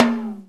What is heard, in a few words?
TICTALK DRUM